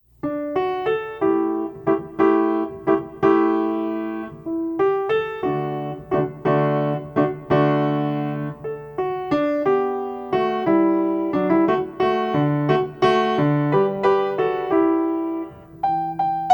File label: piano; Piano instrumental